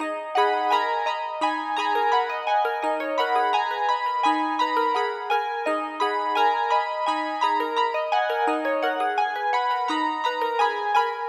HipHop Nice Synth G# Min; Digidesign; Pro Tools Essential Loops

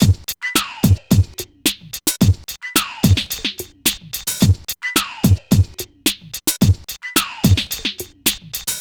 Hip Hop Beat, Drums

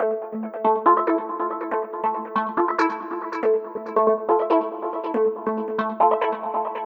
140 House Mid Arpeggio Amin; Dance; DJ; Synth; Arps; Pro Tools Essential Loops; Digidesign

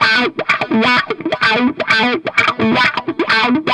128 Pop Guitar Wah Lick B min, Electric; Pop Rock; Electric 100-160 bpm; Pro Tools Essential Loops; Digidesign

